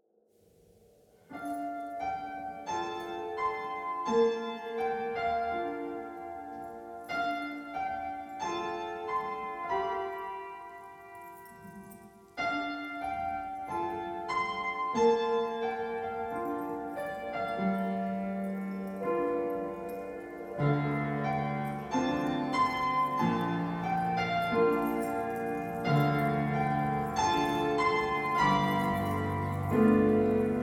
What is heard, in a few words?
INSTRUMENTAL; Cinematic, Soundscape, Minimal